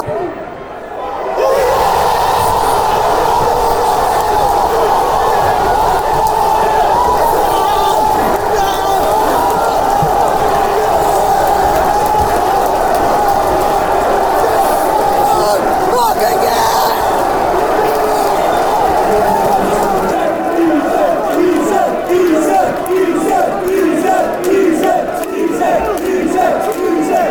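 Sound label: cheltenham score, football chants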